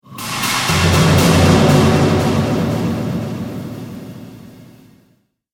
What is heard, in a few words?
Drums Cinematic Stinger; Sonic Logo Sound Effects